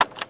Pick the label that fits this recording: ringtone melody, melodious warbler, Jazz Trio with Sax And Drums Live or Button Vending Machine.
Button Vending Machine